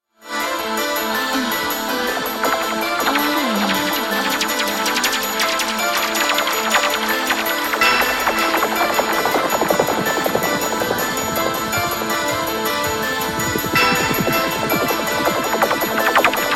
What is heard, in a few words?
tubular bells